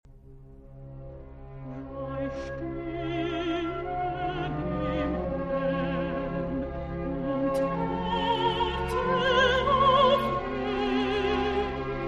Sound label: contralto